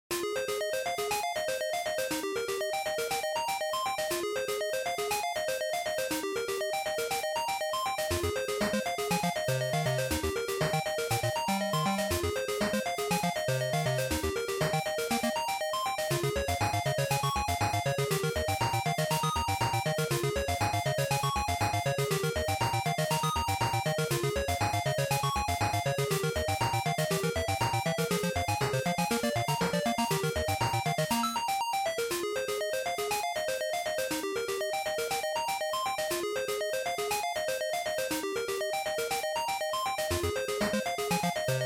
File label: Action, Title